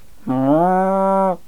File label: Cow